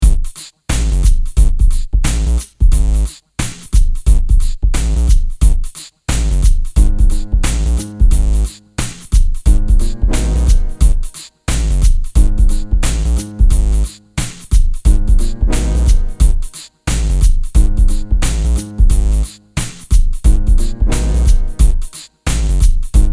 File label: Rap Hard Loop